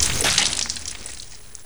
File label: bloodspout; Physics